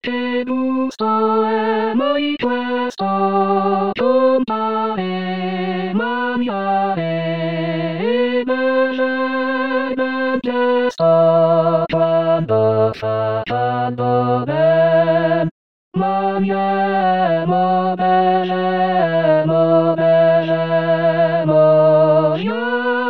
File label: che gusto solo; 2022 baroque